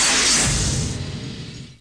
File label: Eye Death; Macworld (1997-11); Games World; Shareware Games; Arcade; Peng! 1.0